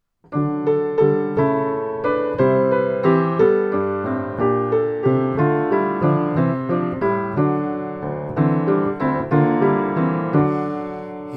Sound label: descant tenor; GeneralHymns; choir music